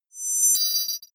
confirm swell click; soundpool

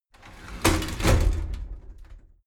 shower slide door inside close heavy

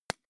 gun shot silencer medium; schusswaffen

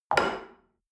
shell upside down place on table hard; soundpool

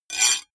metal rattle short; industrie wirtschaft; metall

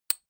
click glass generic, signale

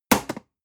storage fall table hard, box; objekte

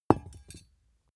small metal drop clang soft; industrie wirtschaft; metall

